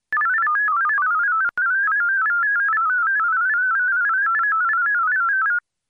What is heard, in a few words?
VARA HF; ham